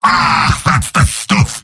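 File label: positive vocalization, male; demoman; tf2; garrysmod; mvm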